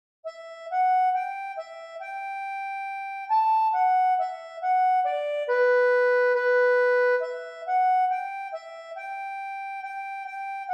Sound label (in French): ACCORDEON